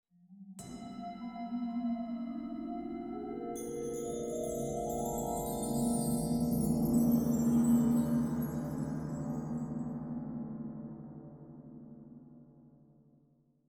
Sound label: Magical Effect; A Celestial Odyssey